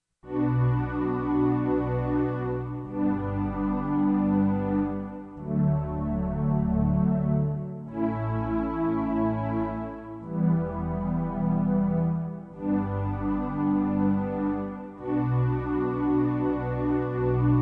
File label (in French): roland s50 sons